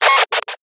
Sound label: science fiction radio signal interference burst